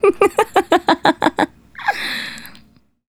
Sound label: Female Laugh, Hip-Hop and RnB Vocals